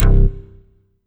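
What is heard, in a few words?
Kawai K5000W Synth bass 2 C1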